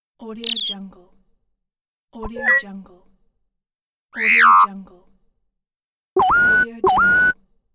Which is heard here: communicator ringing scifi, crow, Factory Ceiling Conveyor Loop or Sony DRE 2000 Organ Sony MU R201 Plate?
communicator ringing scifi